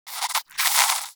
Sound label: Rodent Vocal Snore; Warframe; Hunting Critters; Characters